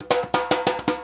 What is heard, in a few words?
FILL, Percussion; InVision Interactive; Percussion Slam